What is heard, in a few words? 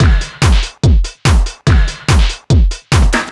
Dance Drumloop